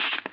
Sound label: radio click